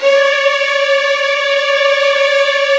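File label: violin trills; ILIO; Kirk Hunter Virtuoso Strings VOL-1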